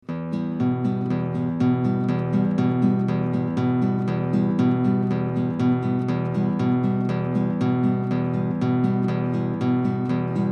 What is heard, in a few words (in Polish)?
rytm 2-4 e moll tempo 120